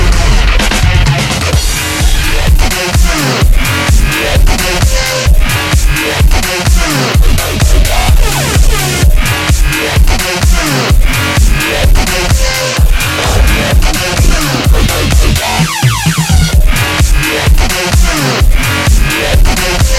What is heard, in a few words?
Dubstep